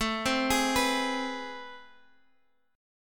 A Minor Major 9th, down; arpeggiated; JGuitar

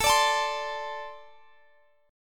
A Suspended 4th Flat 3rd, guitar chord; A4-3 strummed

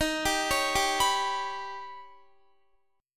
Eb Augmented 7th Eb, Guitar; arpeggiated; x,18,19,18,19,19

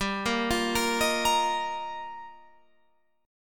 G Minor 7th Sharp 5th, Guitar, Open F; Gm7#5; arpeggiated; JGuitar; 19,17,17,17,18,17

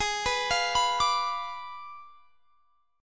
Ab Diminished 7th Ab; Abdim7; arpeggiated

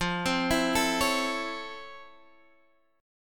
F Major 7th Flat 6th, F, guitar

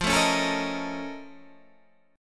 A sharp Minor Major 11th F

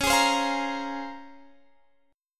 Db Major 13th; strummed

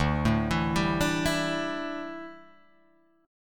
D Suspended 2nd Suspended 4th, Guitar, Open G

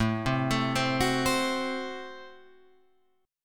A Minor 6th A Guitar Open F; Am6 arpeggiated; 9,7,9,7,9,7; JGuitar

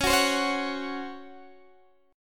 Db Major 7th Suspended 4th, Guitar Open D

